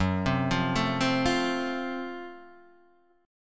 F sharp Minor Major 7th Flat 5th, Guitar, Half Step Down